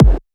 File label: kick oneshot deep, Pop; Drums; Slate; SD PASADENA Pop Sample Pack